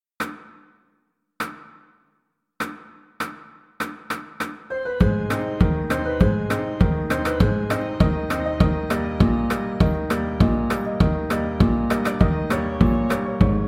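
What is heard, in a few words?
alto saxophone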